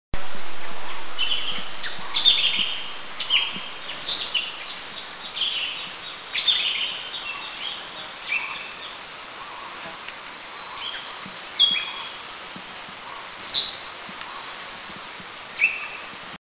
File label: Striated Bulbul; Eaglenest; India